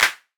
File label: clap; dembow latino